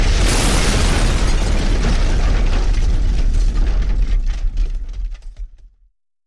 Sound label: explosive debris; soundscrate